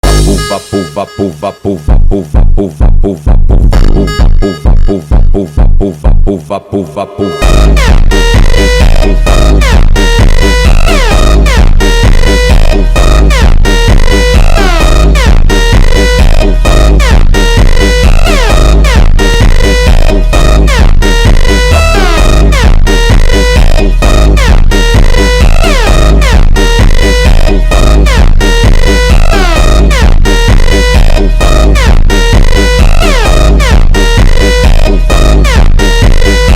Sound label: Phonk